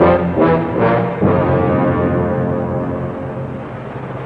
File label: dark fanfare